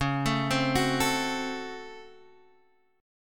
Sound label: C sharp Major 7th Suspended 4th Sharp 5th C sharp Guitar Drop D